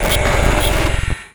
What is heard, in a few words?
Teleport Glitch; MiSide